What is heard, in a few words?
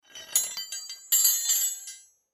Metal Hooks Pick Up Scrape; Objects; Foley; Blastwave